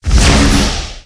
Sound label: skill explo